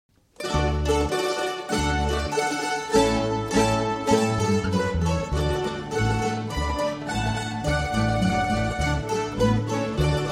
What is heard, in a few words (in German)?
Mandolinenmarsch; Coburger